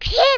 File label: pip